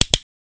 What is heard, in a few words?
options release; Clover Rollover 2